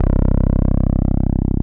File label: TB-303 OVERD; Masterbits; Vintage Voltage